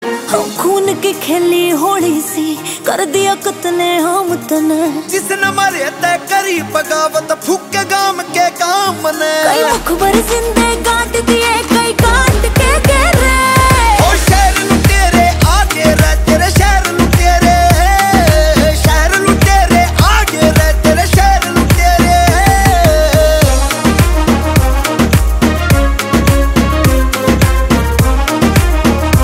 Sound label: Ringtone